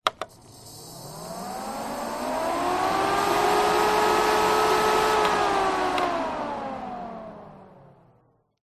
air cooler electric on run off short rear pov inlet; zapsplat